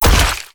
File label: Blood Splat; Evolve; Wraith